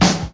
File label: Trebly Snare Drum Sound F# Key, snare tuned to the F# note